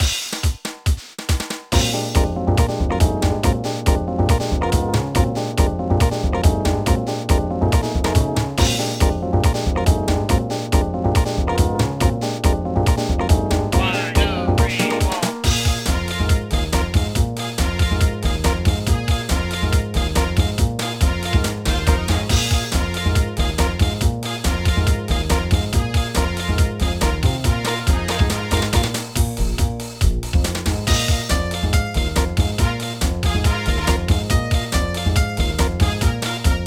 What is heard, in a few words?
Jazz Stage (Karaoke); pinky street kira kira music night pop town gamerip